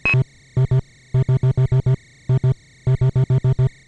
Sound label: beep and background